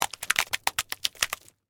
Splatter Mud Debris, Texture, Splatter, Mud, Debris, Drop; Drops; Objects; BlastWave